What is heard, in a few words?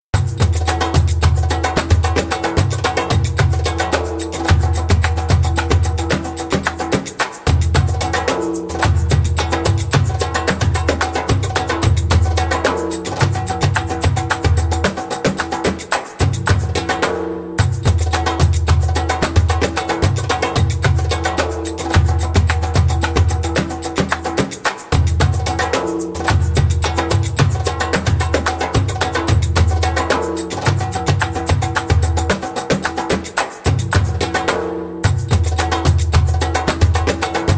Mi Amor Saidi fast spoken, Djembolale; Djembolale - Grooves and Moves